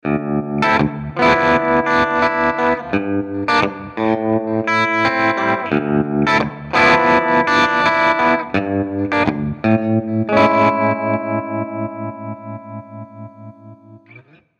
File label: MF Trem Reverse Effekt